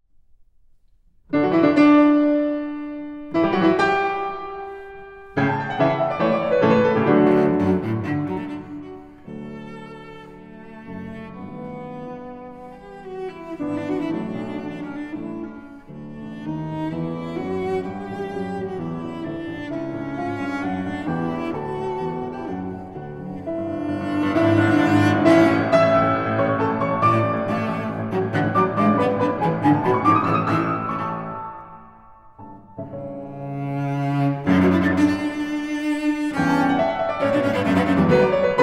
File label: Cello; Beethoven Satz1